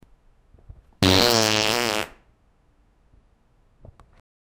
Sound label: a violent fart outside